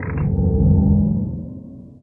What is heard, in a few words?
booster power increase